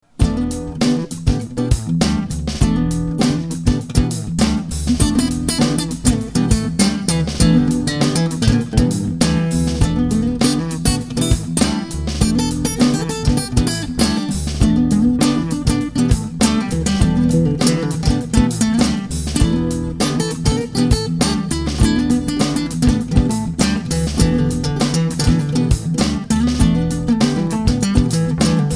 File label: Acoustic Funk bits in E Minor; admiralbob77